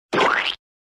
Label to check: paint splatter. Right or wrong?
Right